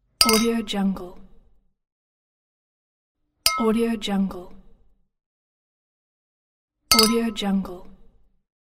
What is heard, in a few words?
glasses; archisound